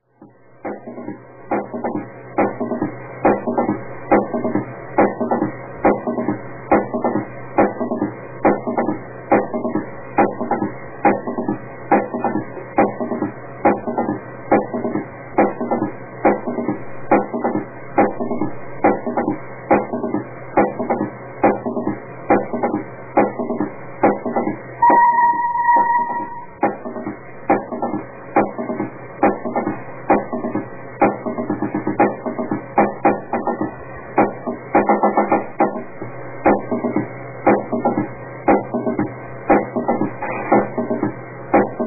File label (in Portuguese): bateria